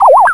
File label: Chirp u Weep